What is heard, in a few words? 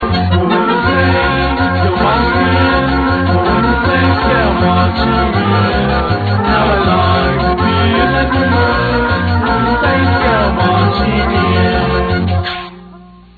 marching saints